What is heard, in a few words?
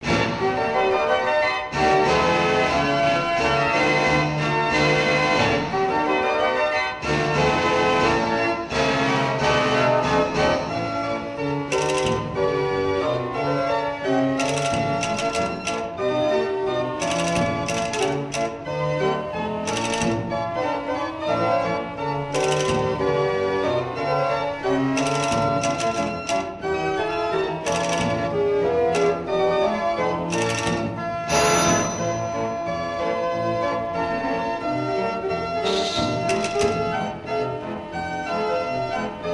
wurlitzer; WURLITZER STYLE 32 CONCERT PIANORCHESTRA